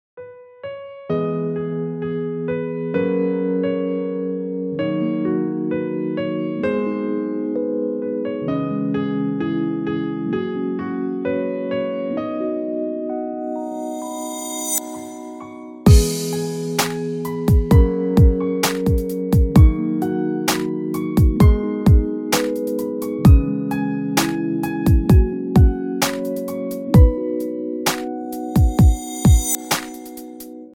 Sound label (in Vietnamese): nhac nen yen tinh em diu trong lanh va am ap